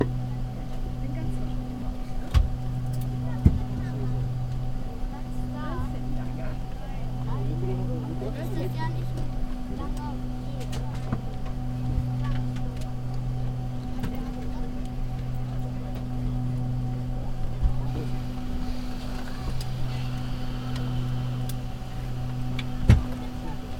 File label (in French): Avion, Bremen